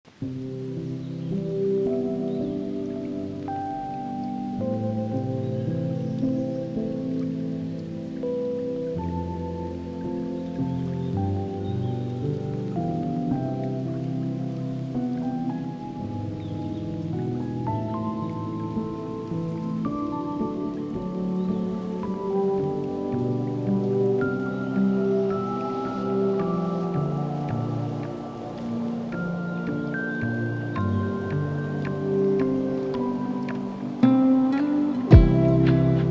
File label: lofi sleep; town; final fantasy i